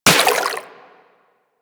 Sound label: Water Sprayer Beam Impact Flesh; Warframe